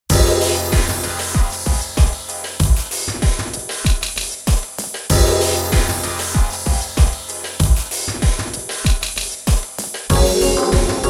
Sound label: Event Tension; Gun Gun Pixies; PS Vita